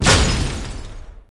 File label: hungering cold; csgo; wcs go